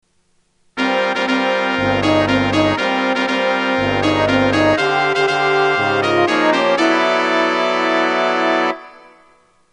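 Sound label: opening fanfare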